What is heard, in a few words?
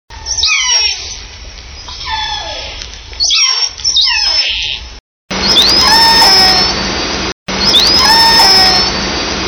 L54 Group and K13s Similar Call, SRKW Orca Calls; Hyde